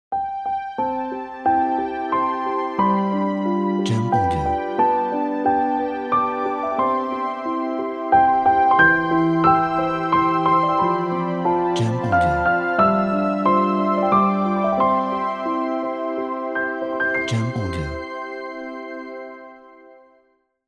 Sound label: Happy Birthday Logo